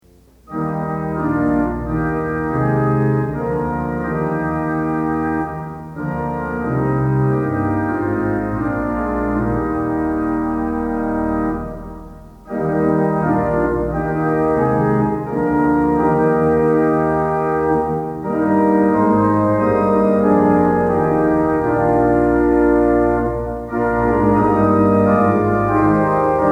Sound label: Organ Music for Worship Services; Lord, Thou Art Love, the All of Heaven; Twenty Liturgy Hymns